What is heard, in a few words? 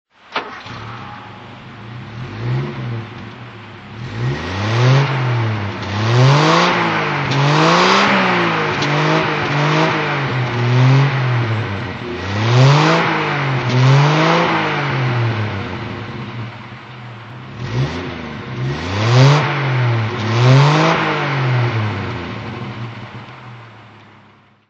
rover p4 75 throttle